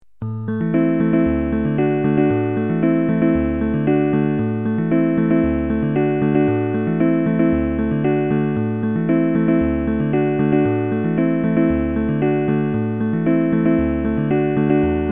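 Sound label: toys, stock game music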